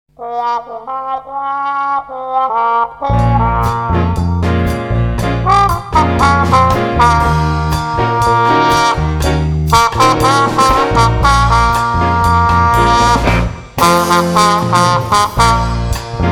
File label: Bossa